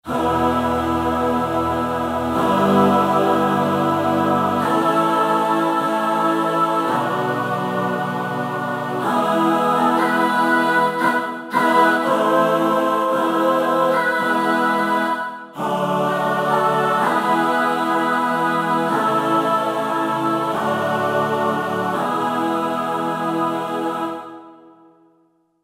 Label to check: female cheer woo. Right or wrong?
Wrong